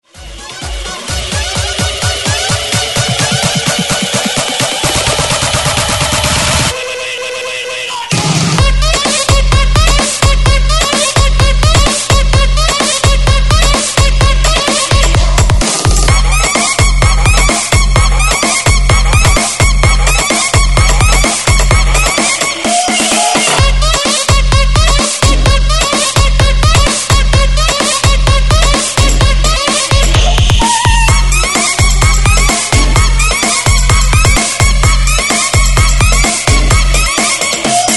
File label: BREAKMIX; DJDOLLS